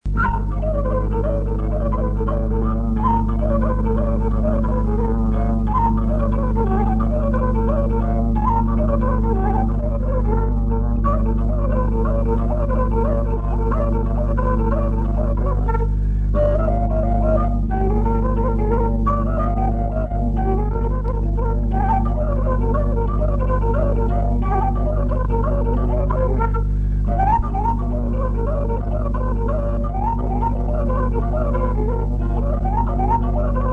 Sarba batraneasca la fluier; jocuri si cantece batranesti; Vaslui

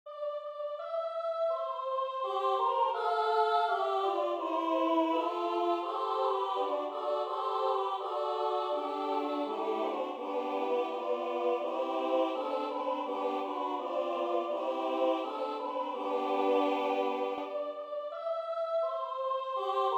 Noi umblam si colindam, Moldova, colinde

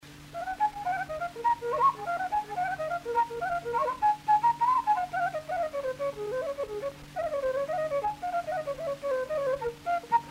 Cernita la fluier; jocuri si cantece batranesti; Vaslui

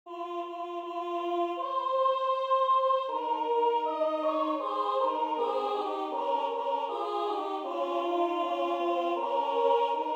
Sculati sculati boieri mari, Moldova, colinde

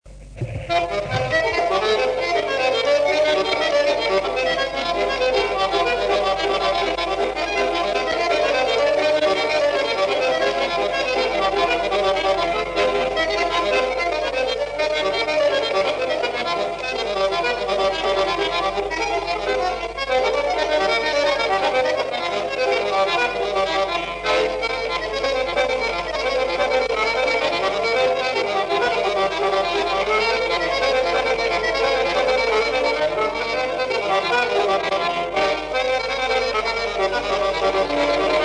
Sarba la acordeon; jocuri si cantece batranesti; Vaslui

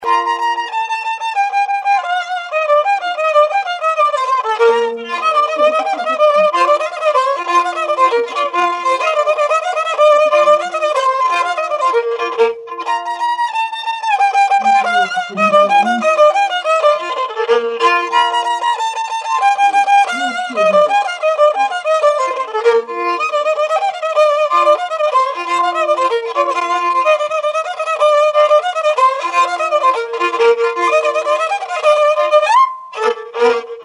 Sperla la vioara; jocuri si cantece batranesti; Vaslui

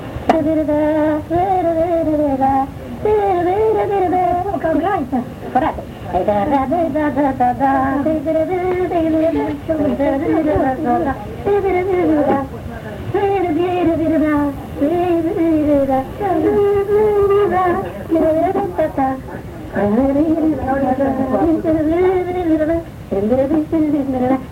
Polca dreapta, joc si cantec popular vasluian; Centrul Judetean pentru Conservarea si Promovarea Culturii Traditionale Vaslui

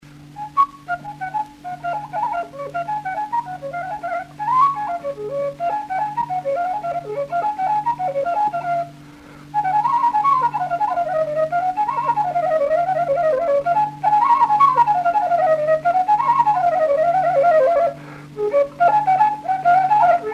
Sarba la fluier; jocuri si cantece batranesti; Vaslui

